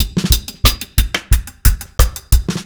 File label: RG90 DRUM, REGGAE; Best Service Advanced Media Trax Modern Composer